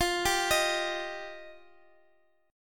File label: F 7th Suspended 2nd F, Guitar, Open D; x,x,x,11,10,13